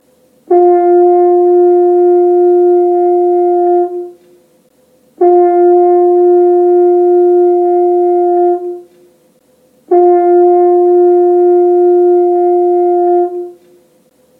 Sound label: french horn c